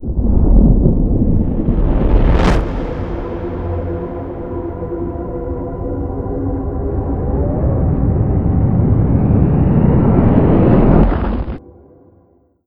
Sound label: chapter 2 stinger